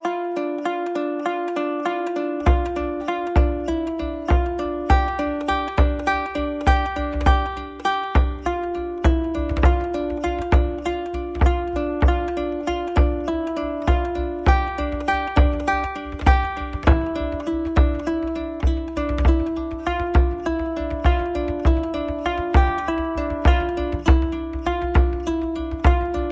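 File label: vocal+kitara+drum; Assassin's Creed Valhalla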